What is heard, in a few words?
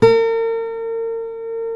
B 4C MARTIN 6, Guitars